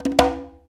Conga Lo; Roland BK-5; Percussion

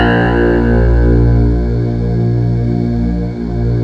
YAMAHA FM; OTHER SYNTHS; Rubber Chicken Software Sample Bank

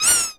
Cat Meow; Gegege no Kitaro

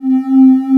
MATRIX 12, OB Synths

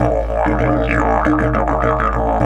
DIDGE 100BPM

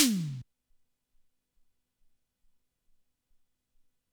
sn, Drum Machines, ELKA X1000

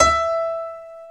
PIANO, E; SY PIANO; Best Service ProSamples vol.10; AKAI; House

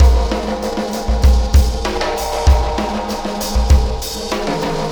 Oscillation Beat

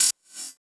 HH, 160 BPM; Ueberschall; Drum & Bass Flash Trackz

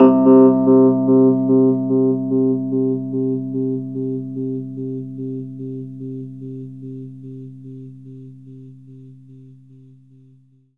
RHODES OCTAVE; 3D Sound; 3D Keys VOL-1

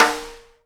snare RIM RING, Live Snares

